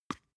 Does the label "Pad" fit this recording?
No